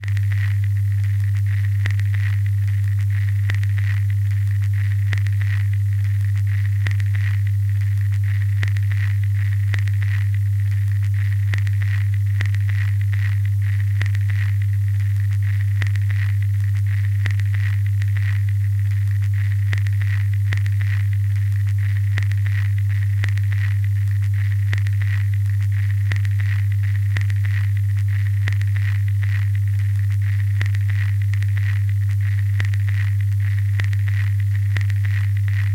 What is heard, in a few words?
old record player